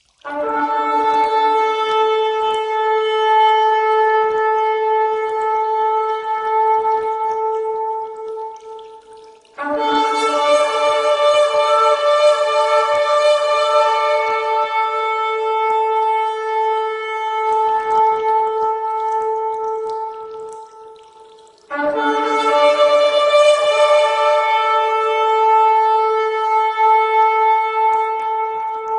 shofar trumpets

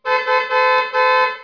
vehicle

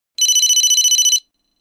motorola ringtones

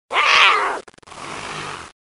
jaguar snarl

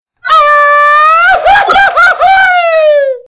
mariachi scream

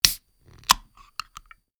Beverage Can Open

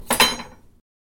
drop fork

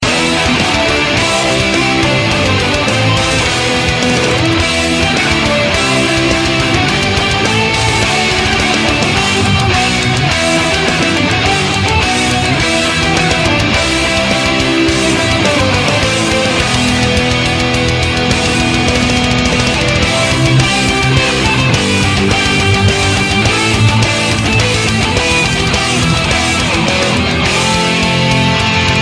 ringtones metal; monkey island

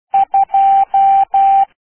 morse code

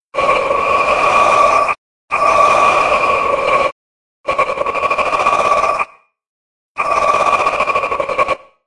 aahh

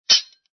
METAL DROP

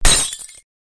shattering shattering

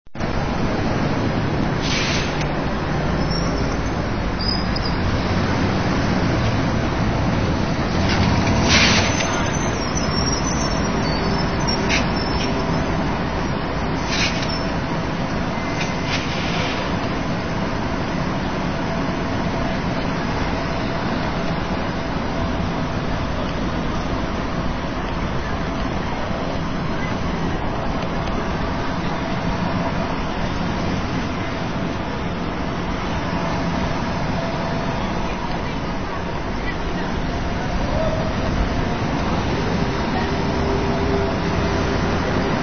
street

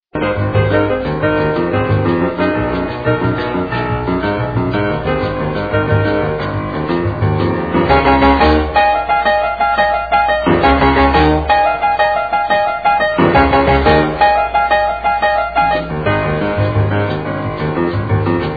ringtones piano; snoopy

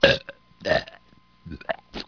people

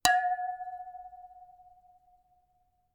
Wine Glass Clink

